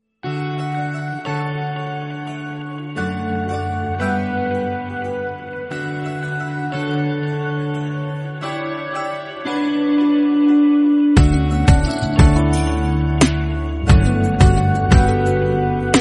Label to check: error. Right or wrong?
Wrong